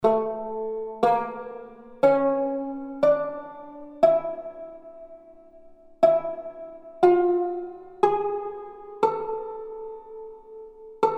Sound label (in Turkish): tanbur zirgüle